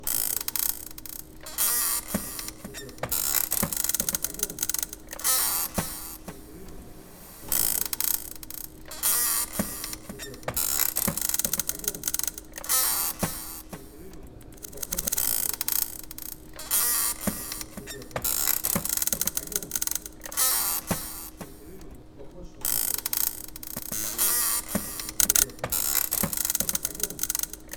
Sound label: chair; sergiyev posad